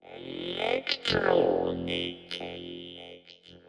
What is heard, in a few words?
electro 130